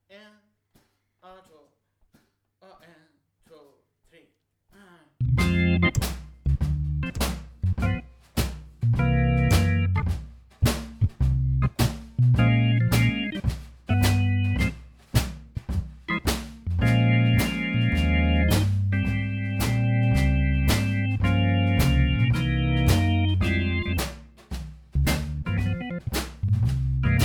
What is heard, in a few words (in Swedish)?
Vispar medium shuffle